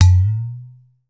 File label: udu, percussion